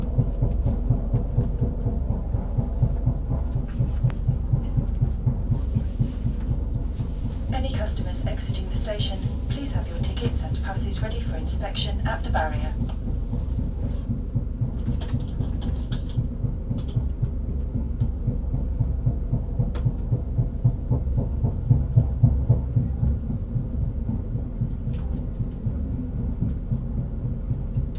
transpennine express diesel uk train sounds like a steam train